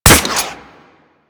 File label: Harpoon Gun Shot 3D; Warframe; Sharkwing; Grineer